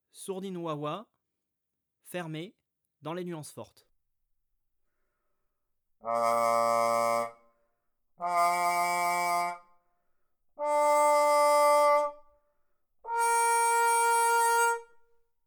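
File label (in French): sourdine wa wa fermée nuances fortes, trombone; Sourdines; Sourdines fixees; traite orchestration; trombone tenor trombone basse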